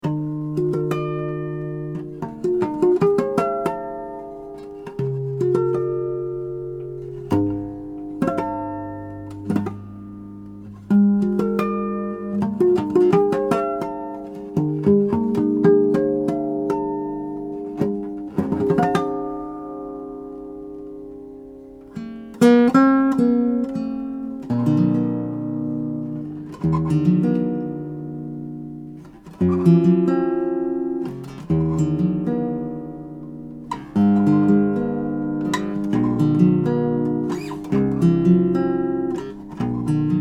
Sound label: Improv Harmonics